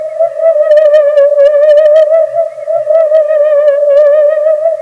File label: Spooked, Ambient Realms; Sony Creative Software; Hip-Hop Street Beats Construction Kit